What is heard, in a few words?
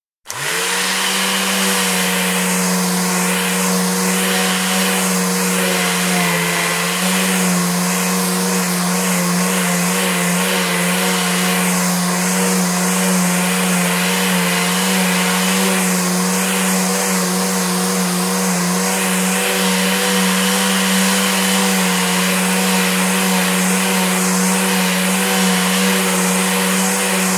Sanding Machines, Electric Sanding Machine operating; Sander; BBC